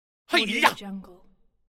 martial arts fight grunt

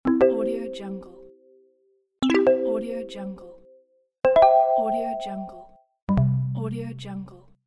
correct answer; tibasfx

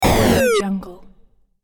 hyper space arrive short; sonic-boom

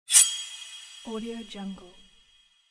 sword

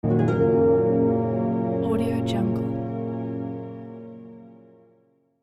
welcome screen; elevaudio

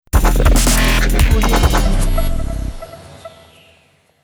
dark dubstep logo

